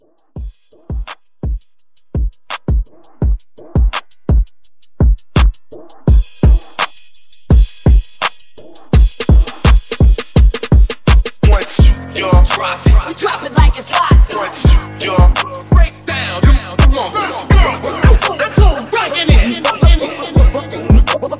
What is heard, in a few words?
Club Hiphop Hype